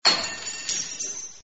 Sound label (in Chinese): bing pian po sui, 冰片破碎